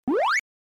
Arcade General Pitch

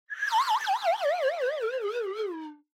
Slide Whistle Phrase

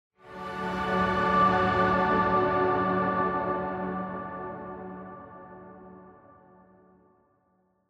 Angel Long

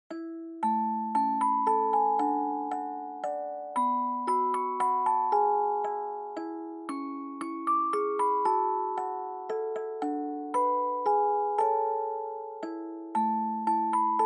Dry Fast; We Wish You A Merry Christmas

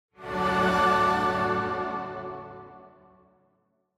Angel Short

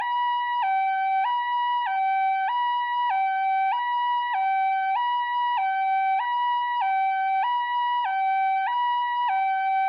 Ambulance Siren

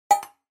Percussive Accent; 打楽器アクセント

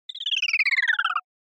Motion Slide Down

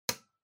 Power Strip Switch

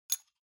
Flint Stick Noise

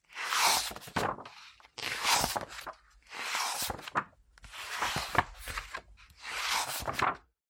Magazine Flip

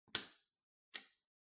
Light Switch Wide Panel, Hall Gentle

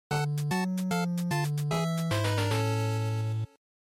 NES Action Game Over

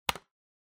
Trackpad Click L Hard

